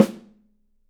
Studio Snare, 3-D Audio Collection, 3D Percussives; E-MU Producer Series Vol. 5